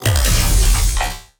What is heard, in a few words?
Electron Impeller Charged Fire; Sci Fi Weapons Cyberpunk Arsenal